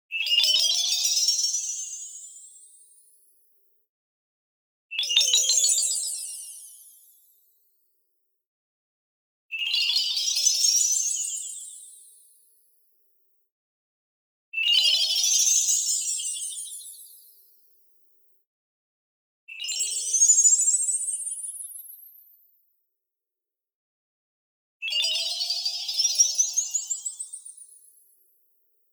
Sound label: BLING Bell Ascending; boom library; Anime Essentials